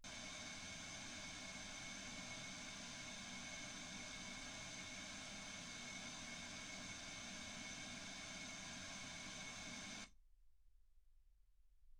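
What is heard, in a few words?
white gaussian noise 48kHz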